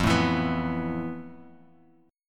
F Major 7th Flat 5th, Bass Standard; strummed; JGuitar

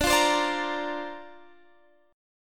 D-Minor 7th Sharp 5th D Guitar Standard, chord; Dm7#5; x,17,15,17,15,18; strummed; capo=2

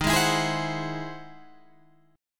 E Augmented Major 9th

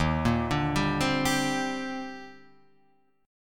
Eb Major 13th, Guitar, Drop D; EbM13; arpeggiated; JGuitar

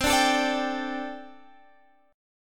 C 6th Suspended 2nd C

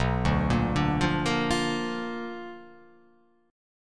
B Minor Major 7th Flat 5th, chord

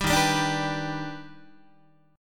Eb Augmented Major 7th Eb, Guitar; strummed; 10,11,10,12,11,10; 4A,4E,5A,5D,5Gsharp,6Csharp

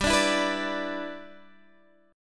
G 6th, Guitar, Standard; G6 strummed

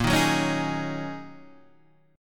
Ab 7th Suspended 2nd Suspended 4th, chord

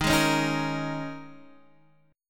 D sharp 7th Suspended 4th; D#7sus4 Chord {X 6 6 6 4 6} strummed

